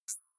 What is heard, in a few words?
click rough high, signale